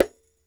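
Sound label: Large Dumbek Mute, World Percussion One Shots; Sony CS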